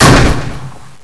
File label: RIFLE